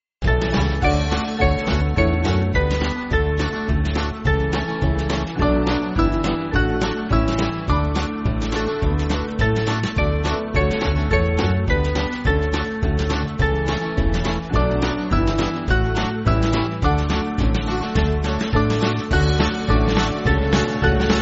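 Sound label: Band, Music Backing Files for Churches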